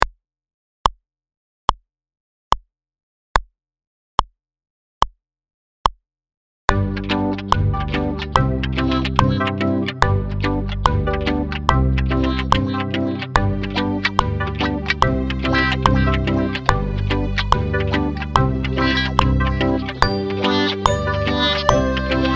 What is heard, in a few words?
click